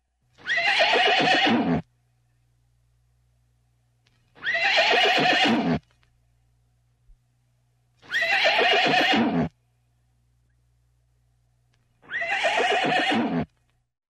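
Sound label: four variants of neighing close-up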